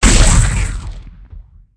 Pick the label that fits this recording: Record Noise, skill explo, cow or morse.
skill explo